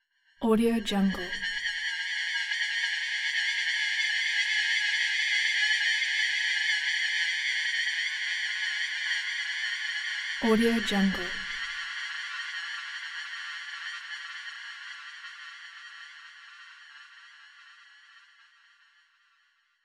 synth tension builder; hollywoodedge

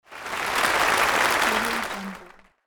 applause

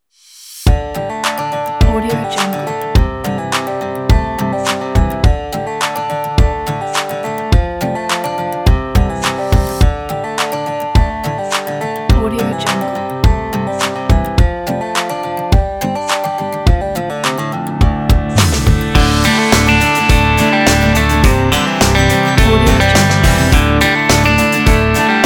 country western

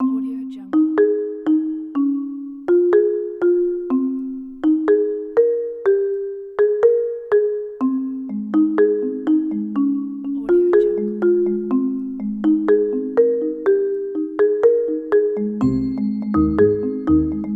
friendly and pleasant; v8v

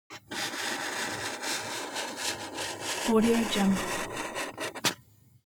object office marker mark soft long; smartsoundfx

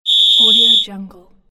referee whistle

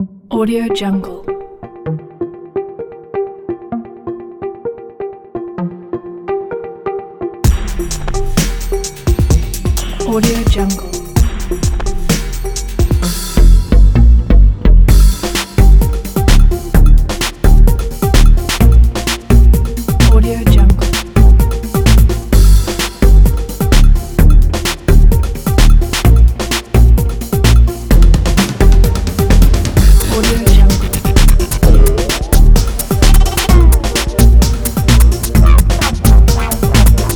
inspire glitch; dspromusic

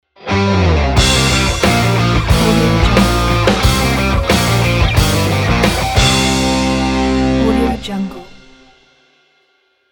rock riff logo

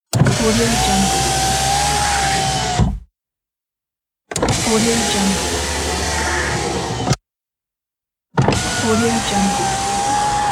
car window

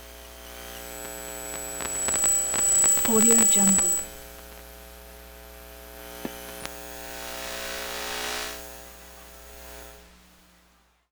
lost transmissions tonal passbys; soundmorph

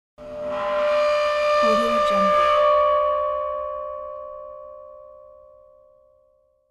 cinematic impact whoosh

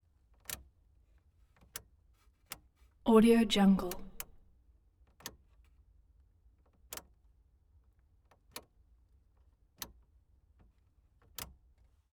jeep wrangler heater switches; collanderson

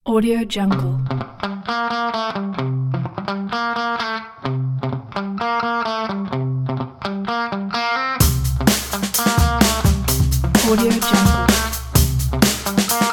playful and upbeat funk

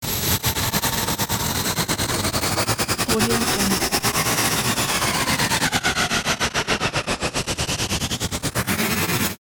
wooden blades fly tubular whooshing chuffs

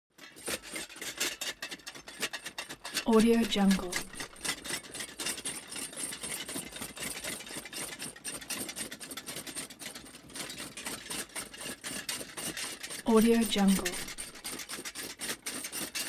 foley armor troops metal clatter run; smartsoundfx

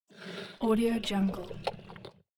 creature eating

